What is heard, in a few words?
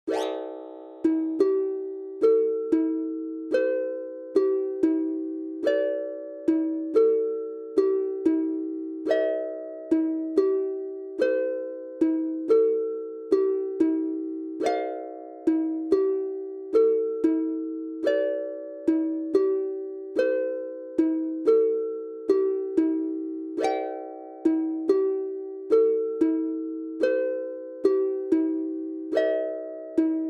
harmonic fibonacci fractal tune harp glissandi